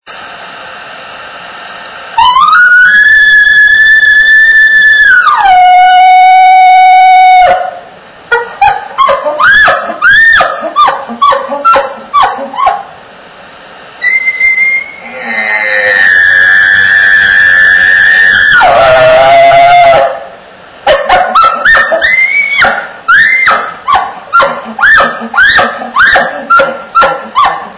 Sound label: bull, elk; elk 2001